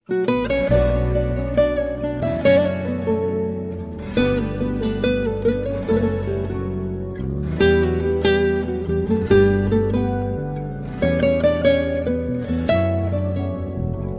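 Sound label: fine guitar; classical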